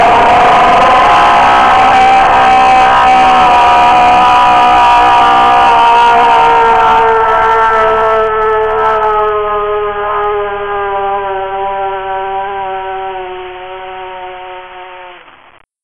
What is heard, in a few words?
jumpscare sound; fgnaf2